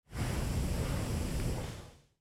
lava; factorio

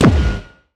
railgun gunshot; Factorio

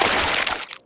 mined, mining; funneltrunk; Factorio

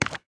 asteroid deposit; Factorio

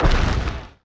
asteroid collision metallic small; Factorio

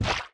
mech armor land semi wet; Factorio

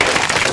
mined tree; mining; yumako; Factorio

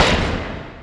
asteroid damage metallic big; Factorio

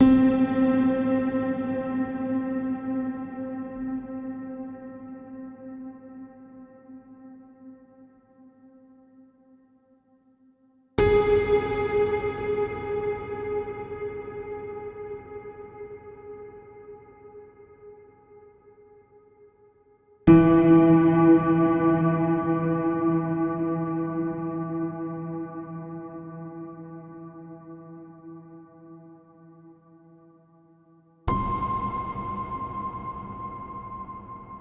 melody a, ambient; Factorio - Space Age

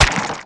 mining; cuttlepop; Factorio

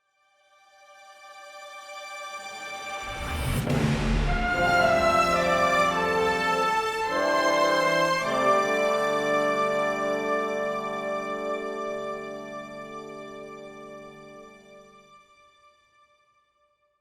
FTL Jump Melodies; Helldivers 2

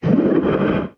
stomper idle big, enemies; Factorio

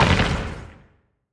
asteroid damage interstellar medium; Factorio

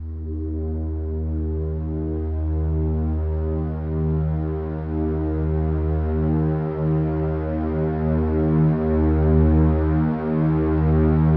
ambient, vulcanus; Factorio

